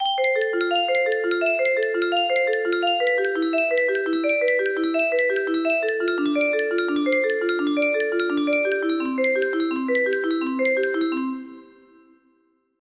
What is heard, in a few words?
c major, music box